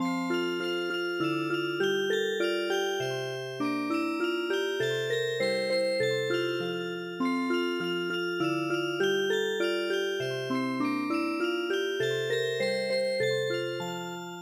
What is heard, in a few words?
Music Box; Pussy Cat Pussy Cat